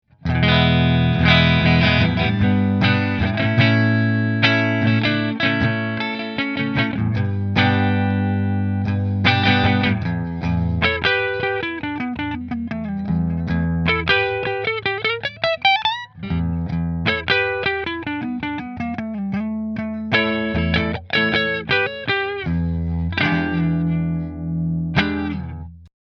Orville Neck Fender, guitars

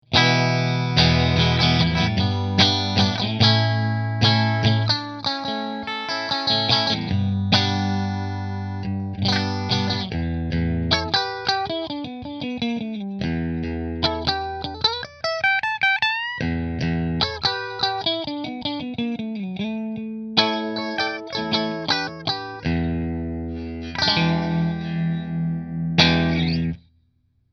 Fender Position 3 Fender

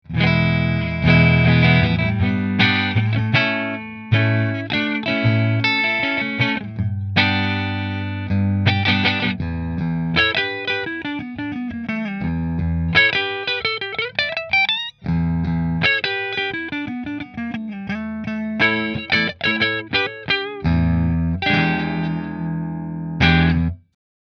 Rahan Single Maple Neck Fender, guitars; Rahan Guitars RP Single Cutaway Figured Maple

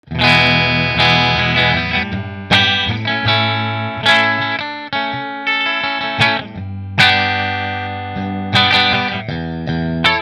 Rahan koa bridge fender, guitars

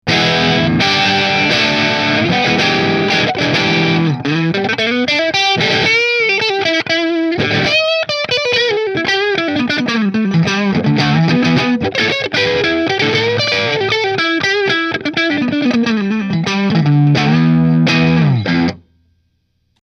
Burny Middle Marshall

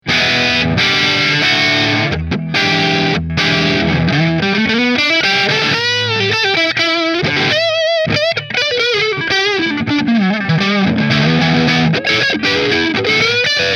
Gibson 335 Neck Marshall

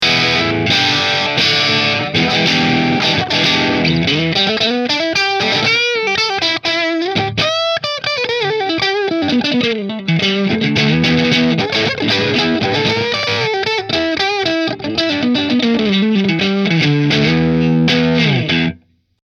Nola Model 8 Driftwood Bridge Middle Marshall

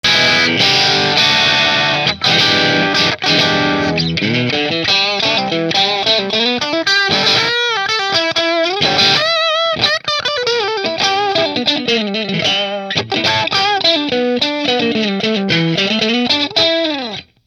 p90 driftwood bridge marshall; Nola Voodoo 3

